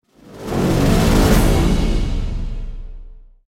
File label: Level Up; The Far Frontier